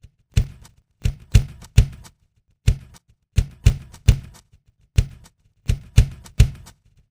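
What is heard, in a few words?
drum, separated component 2; Separation of Sound Sources by Convolutive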